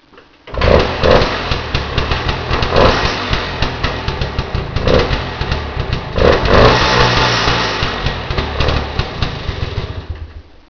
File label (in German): klang